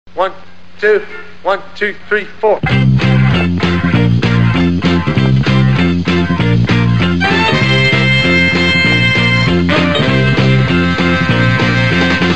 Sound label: blues music ringtone